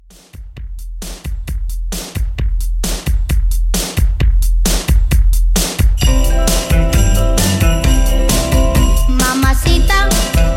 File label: Christmas Re Drum 132 CLEAN